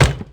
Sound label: wood imp; Daytona